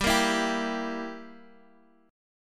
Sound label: E Diminished chord